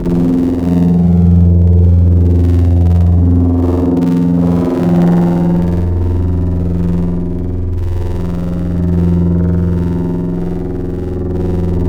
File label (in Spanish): Flauta; Synthetic's Serum Collection; Void Serum Bank - Tables